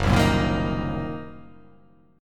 Fsharp 6th Flat 5th down, chord

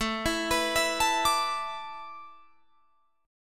A Suspended 2nd Suspended 4th down Guitar Drop D

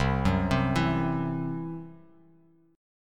C-Major Flat 5th; arpeggiated

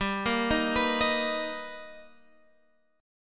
B Augmented Major 7th G, chord

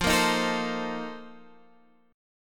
Bb Major 7th Suspended 2nd Suspended 4th

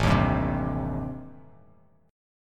A-Minor 7th Flat 5th, Bass Standard, chord; strummed; JGuitar

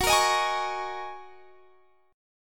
F sharp 6th Add 9th, down, Guitar, Standard; strummed; F#6add9 chord; JGuitar

